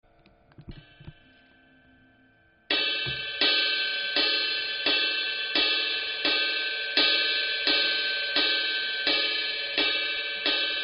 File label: Meinl Byzance 20 Medium Ride Ping